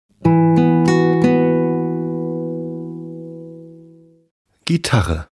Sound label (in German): Gitarre